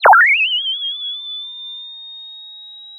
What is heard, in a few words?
Sound of a bird tweeting nicely